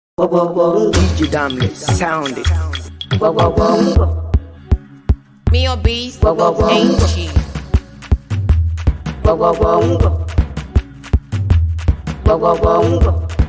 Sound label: Mara Version